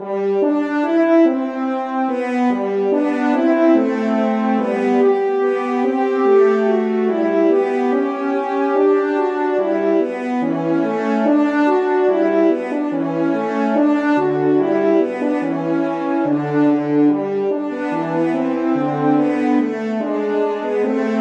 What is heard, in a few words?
Larghetto; Telemann; Canonic